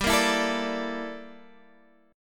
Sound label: G Minor 6th Add 9th G down Guitar Half Step Down; Gm6add9